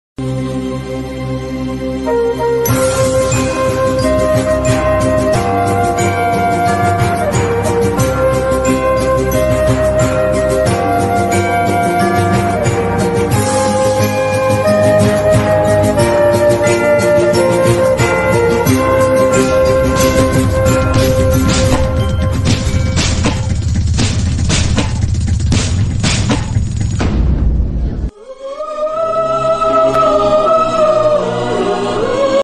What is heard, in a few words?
tamil love bgm bgm ringtone